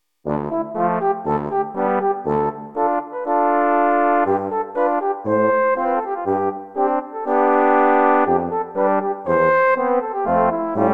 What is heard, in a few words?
Romanian Folk Dance